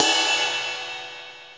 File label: RIDE, CYMBALS